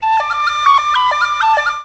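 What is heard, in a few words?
tin whistle toot, Musical Instruments, europe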